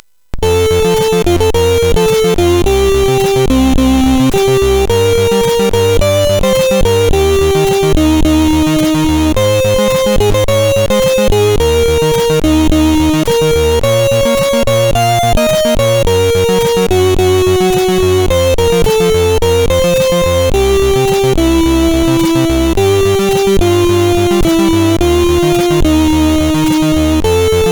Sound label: sid MOS6581R4, GAMES; Dalek Attack